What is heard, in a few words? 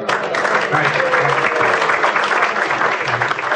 AUDIENCE APPLAUSE; people